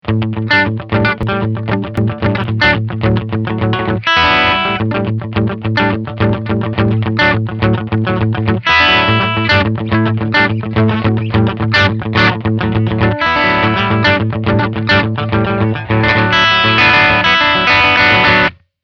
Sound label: Clean Picking